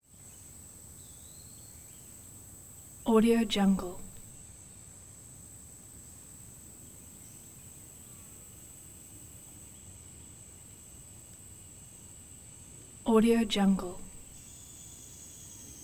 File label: forest missouri; prosoundfx